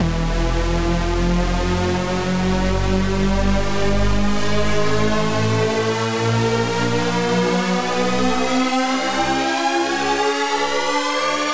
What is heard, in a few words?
Club Music Risers Motor Strong